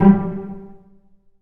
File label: Cel Pz G1; Digital Sound Factory Virtuoso